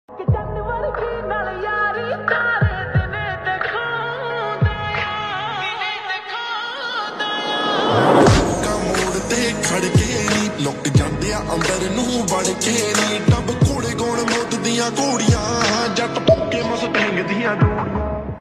slowed reverb bgm ringtone lofi music lofi ringtones